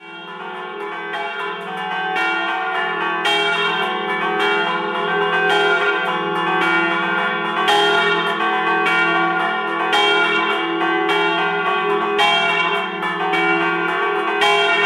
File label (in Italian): campane; FARLA